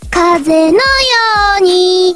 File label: padoru song